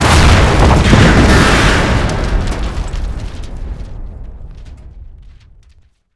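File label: tank explosion large; WAR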